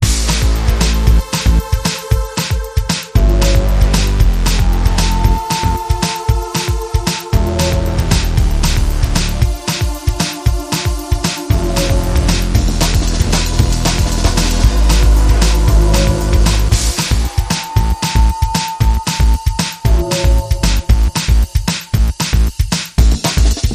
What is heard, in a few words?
Devils Violin